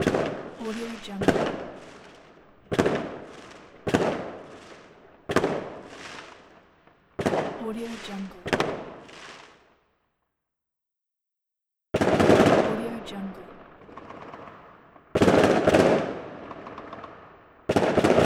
fireworks volleys

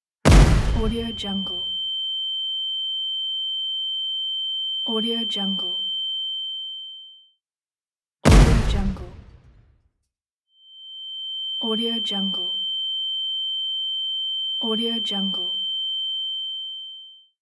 flashbang grenade

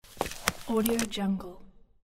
book away

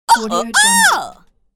cartoon astonished

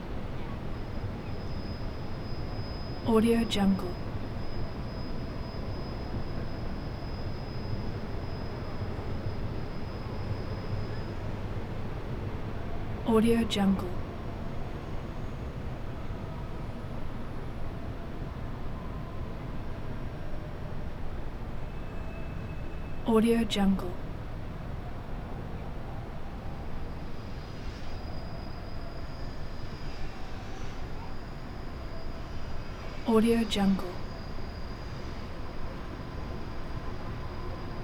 japanese traffic

